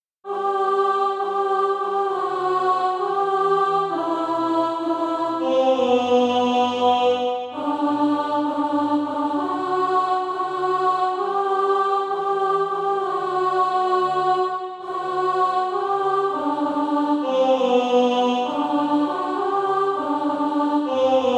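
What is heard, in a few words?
alto, Practise Music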